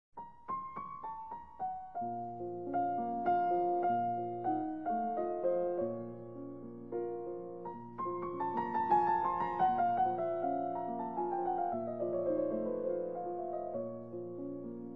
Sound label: Larghetto in B flat minor